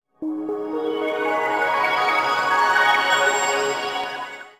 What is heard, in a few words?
Enter; Zenses Ocean; nds gamerip